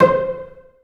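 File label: solo pizzicato, Strings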